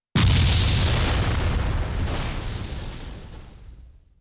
Explosion BG Distant

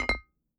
Pots

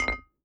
Pots

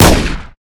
pistol; ML-Agents; FPS Game

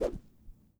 Swing Cane

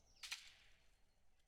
forest motion, Night; FontainebleauDemo

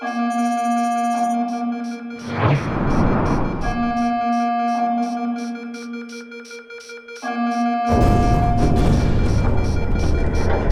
timeline seq attack

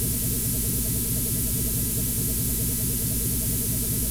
Hover Car; Megacity-Sample; Cars; UnityTechDemoCars

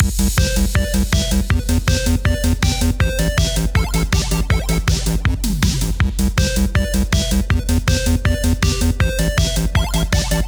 Background Music

